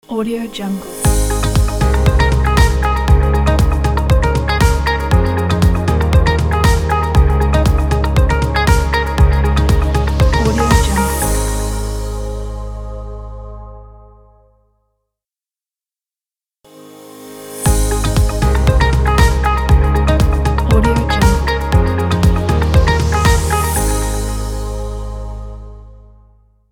the corporate opener ident

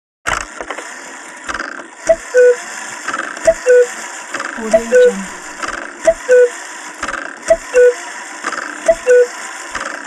cuckoo clock chimes; hollywoodedge

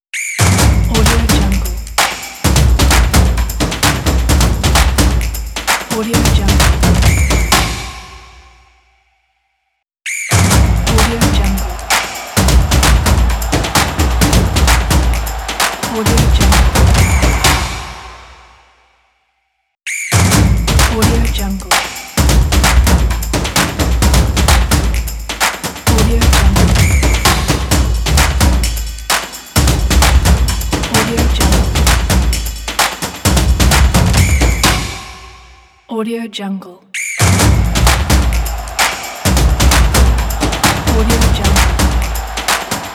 football action percussion logo

